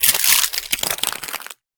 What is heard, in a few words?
ice